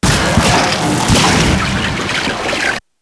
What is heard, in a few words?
rapid peeling; svencoop